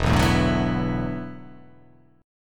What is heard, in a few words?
E 7th Suspended 2nd Suspended 4th, down